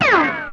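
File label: RICOCHET, WEAPONS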